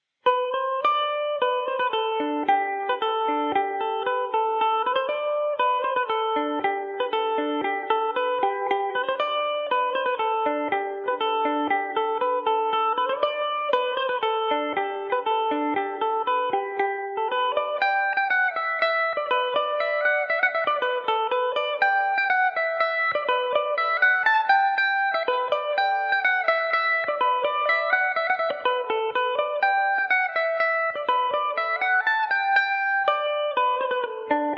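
art o keeffes polka G major revelation revisited, mandolin; Revelation Jaguar electric mandolin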